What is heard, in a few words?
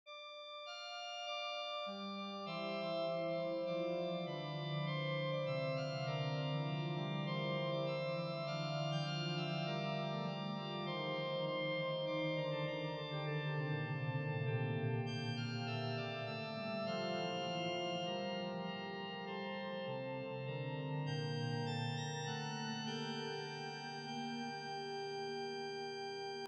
organ